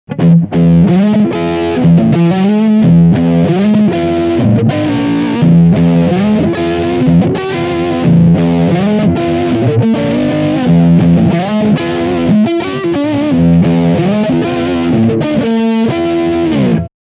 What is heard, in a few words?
Riff Front Humbucker